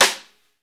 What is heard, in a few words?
SNR, Snare; Ext.Snare; Club-50 - Foundations